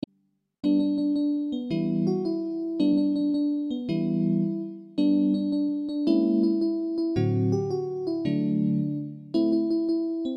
banjo; Soren banjomus; jul

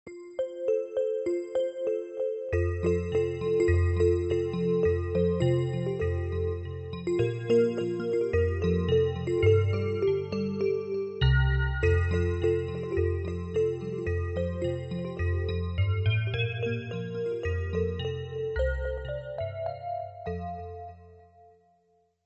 jingle bells; Julekalender

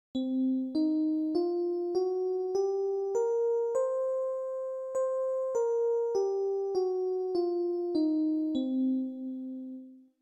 blues

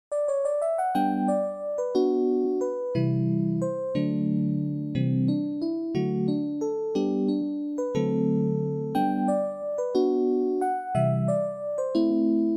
waltz; Marino; folk

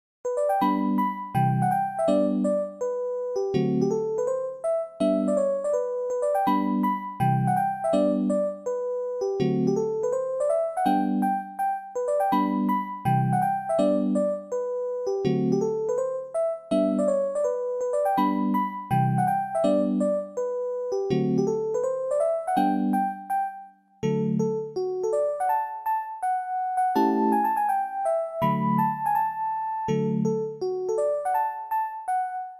polka; folk; Tinus kapellet; folkemusik; Bluebell polka - Sco.

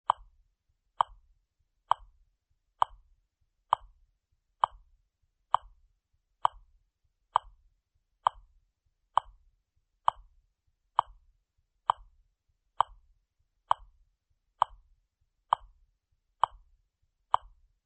bpm66

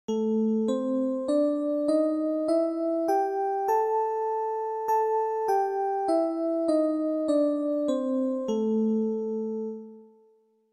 blues

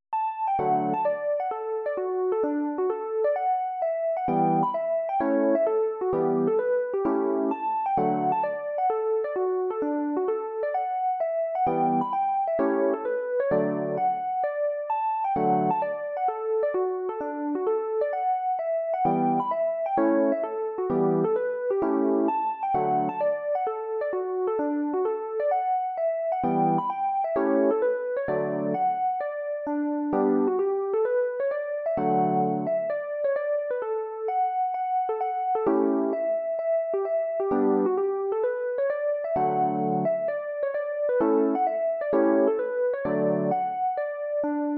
Hornpipe; belfast; folk

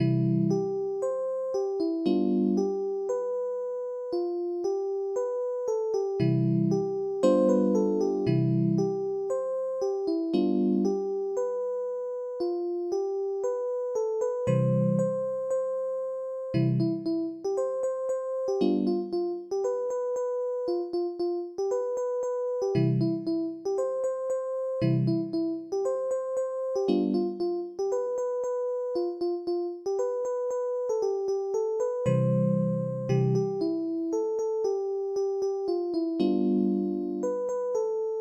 polka; Doudlebska

